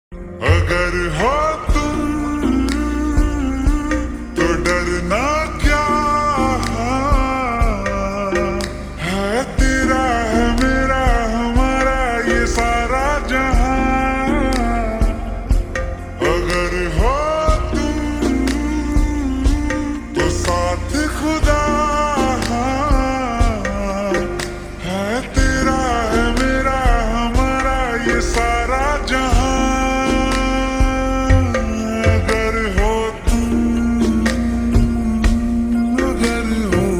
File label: slowed reverb